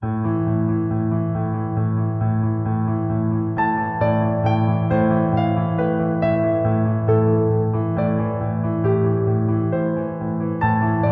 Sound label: Piano